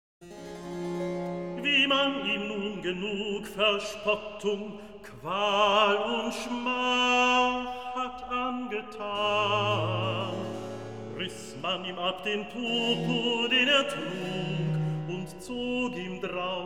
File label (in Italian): Recitativo; Wie man ihm nun genug; Handel; Brockes Passion